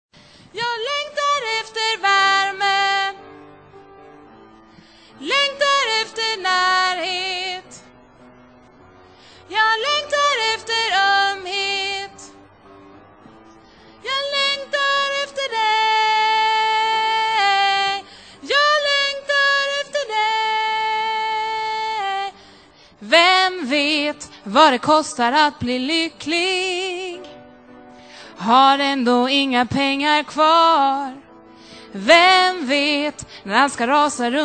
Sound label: tenor